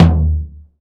Tom Blue Room; Drums

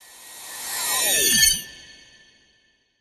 Swell Rusher

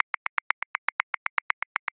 Disco Subtle Loose 16ths; Grooves; Ableton Live 12 Lite; Core Library

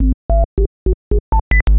computer; Game Maker 8

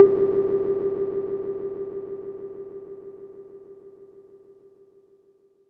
Blop Low; FX Hit; Drums; Ableton Live 12 Lite; Core Library

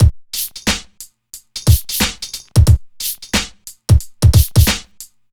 Hip Hop Shuffle Dry 90 bpm, drums; Loops; Ableton Live 12 Lite; Core Library

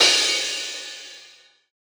Crash Old Time, Cymbal; One Shots; Drums; Ableton Live 12 Lite; Core Library

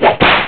delete; Active Worlds

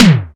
Tom Electronic; Drums

